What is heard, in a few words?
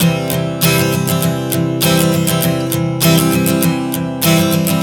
Strum 100 C, One Chord Strumming 100 bpm